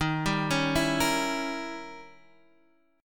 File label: D sharp 7th Flat 9th Guitar Half Step Down; D#7b9